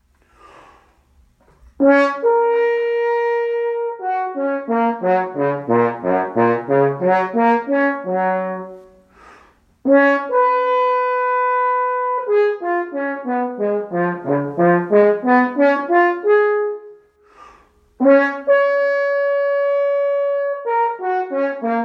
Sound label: horn